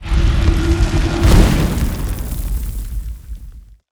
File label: close sandy, doors stone; dragonroll